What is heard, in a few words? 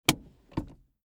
handbrake release; transportation